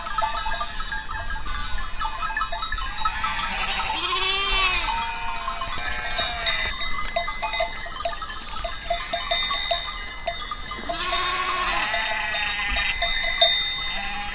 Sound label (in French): crete moutons, sonnailles